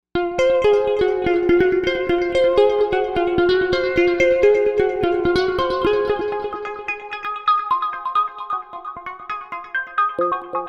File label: PhaseFly Pluck, Novation UltraNova; Factory Patches